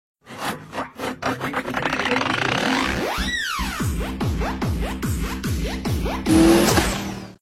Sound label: crazy dancing music